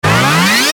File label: Ascend Buzz Fast Power Up; Blastwave